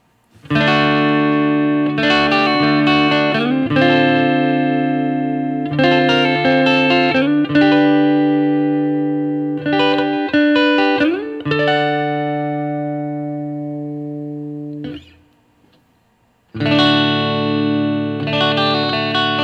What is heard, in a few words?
Guild S300